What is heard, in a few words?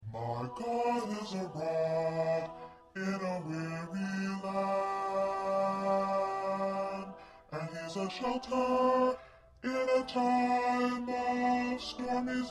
1st Bass Low